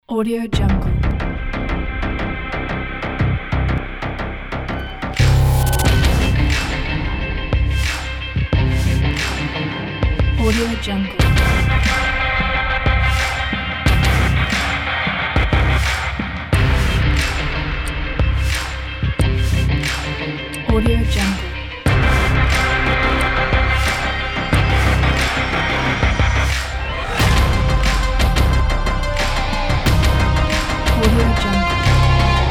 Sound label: police chase